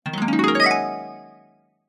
fantasy magic wand spell classic harp glissando fairy; zapsplat